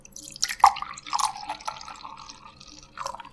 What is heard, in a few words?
water